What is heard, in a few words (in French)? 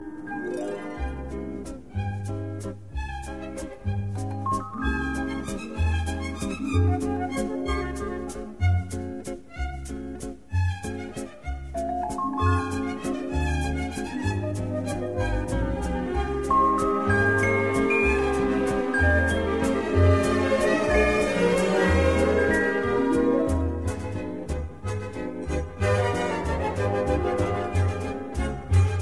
Valse